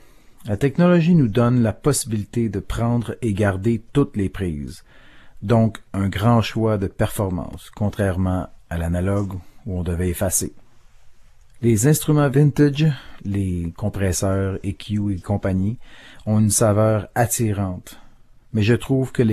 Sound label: techno vintage; Grand choix de performances avec l´enregistrement numérique et qualité des équipements réédités; enregistré en 2006